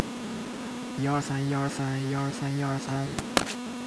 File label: cap AGC, microphone recording; TLV320AIC3104; the microphone recording gain is too low